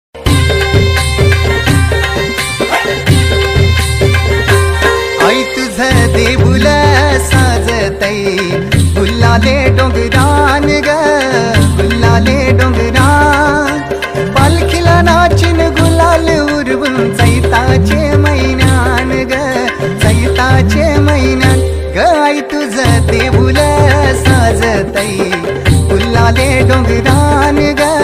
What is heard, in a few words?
marathi song ringtone devi song ringtone